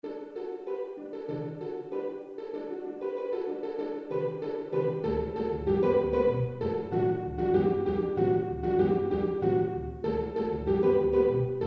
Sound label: string orchester